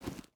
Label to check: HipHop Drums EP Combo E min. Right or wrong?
Wrong